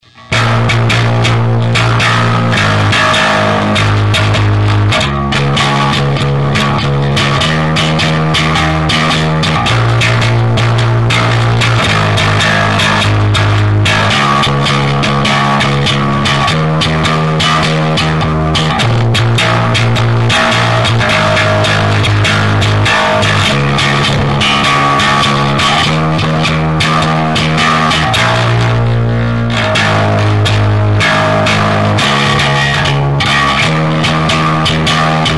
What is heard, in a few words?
bass